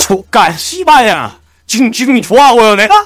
negative vocalization; scout

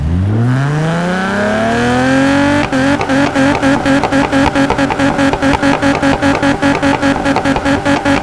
rev; nfs heat ganimator pack

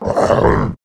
attack, male zombie; nmrih; zerosiki; slender

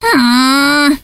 stabbed; freak fortress 2; hat kid

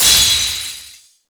stomp stun; chiabihamaru; cv project; invading executioner; zerosiki; slender

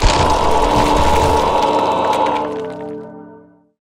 death; zombiesurvival; medieval raid; arkantos

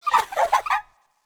chasing

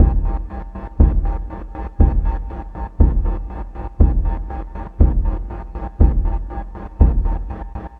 chase music; itsteals; legs; slender

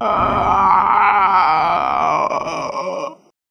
female zombie sighted; nmrih; zerosiki; slender

